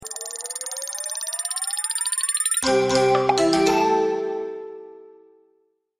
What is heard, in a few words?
wheel; nissan test drive; lucky wheel